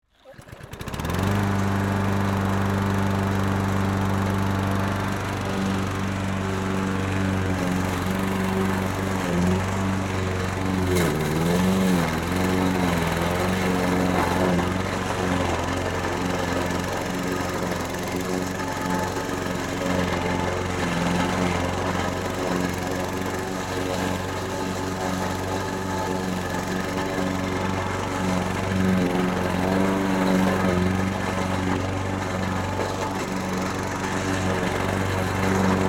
Lawn Mower Run, Household, Lawn, Mower, On, Run, Off, Rough, Terrain; Lawn Mower Sound Effects; Blast Wave